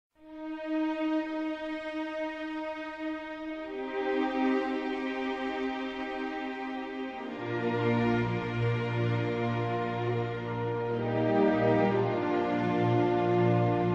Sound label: National anthem of the Republic of China Taiwan 中華民國國歌演奏版